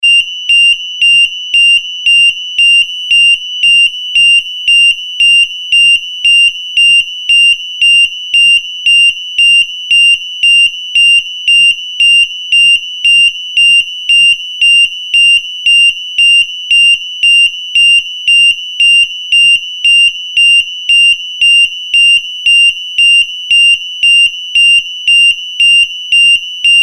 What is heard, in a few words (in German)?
Akustischer Wildretter